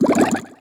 potion use; Fate; ITEMS

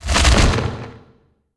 volcano door close; Fate The Cursed King

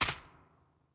footsteps nest; Fate The Cursed King

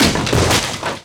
barrel break; Fate Undiscovered Realms; ITEMS

